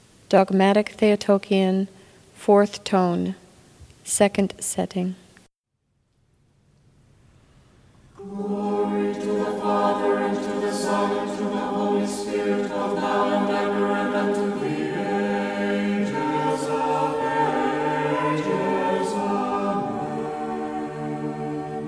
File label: Dogmatic Theotokion Tone 2; Setting 2